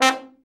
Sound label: BRA TROMB, Trombone; Sound & Vision - Gigapack II CD 1